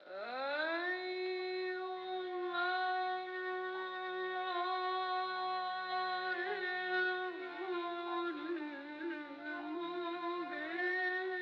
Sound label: Vox, dub; ODGPROD